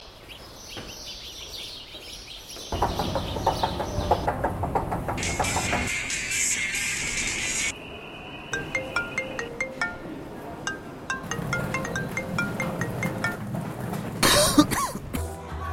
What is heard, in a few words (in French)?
ambiance